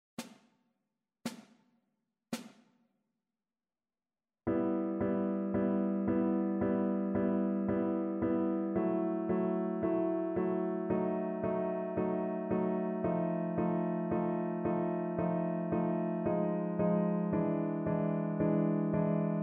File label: vn pf; chopin; preludium e-moll